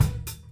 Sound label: HIT